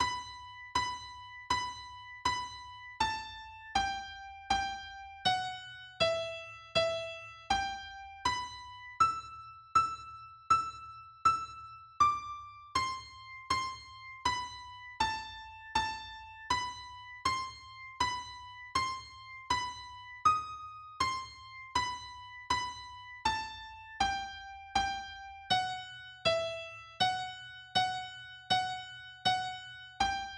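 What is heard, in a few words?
Spanish Romance, Traditional Solo acoustic guitar; AkGit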